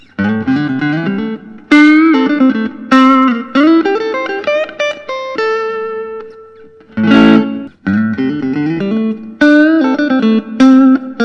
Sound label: Fender custom shop